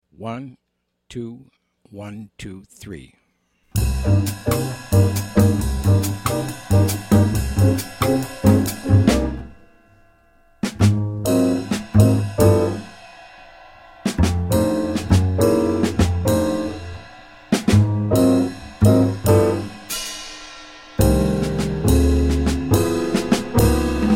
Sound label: minus Melody